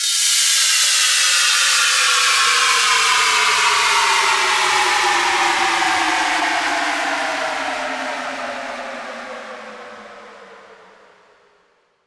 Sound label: fx downlifter 150 exhale; Virtual Riot; 8bar